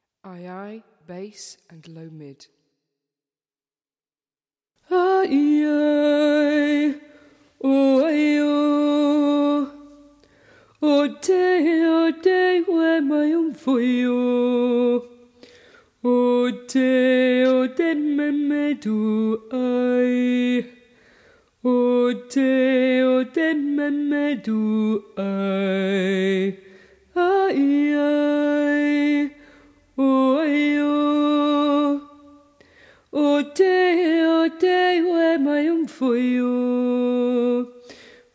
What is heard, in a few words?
BASS LOW MID; AYE AYE